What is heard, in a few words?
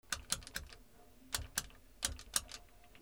PHONES